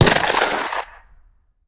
Bullet Impact; Sound Ideas; Guns

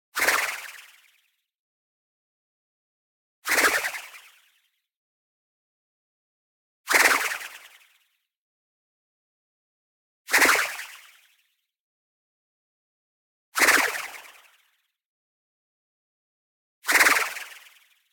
MOVEMENT FAST Neutral Noise Bubble Flutter; boom library; Anime Essentials; DSGNMisc

